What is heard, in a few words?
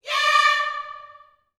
"yeah" D 5, Gospel; Spectrasonics; Vocal Planet Vol.1 Gospel; akai